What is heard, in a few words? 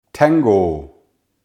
tango